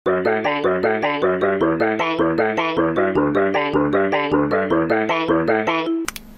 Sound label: Xiaomi; Talking Ben